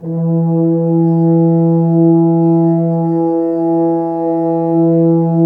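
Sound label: French Horns mf, Dry; Roland LCDP06 Brass Sections; F.Horns 2 mf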